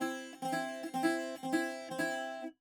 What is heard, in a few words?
bouzouki